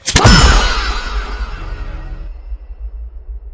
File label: melee parry success; alternating fahh parry sounds